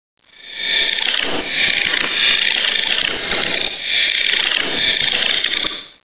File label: dead tree